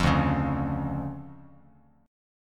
D Minor Major 7th D down Bass Standard; DmM7 strummed; JGuitar

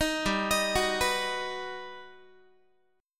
G Augmented Major 7th, Guitar; arpeggiated; 18,15,18,16,15,x; 4A,4E,5A,5D,5Gsharp,6Csharp

